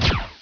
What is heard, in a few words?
Enemy Laser; Robotic Invaders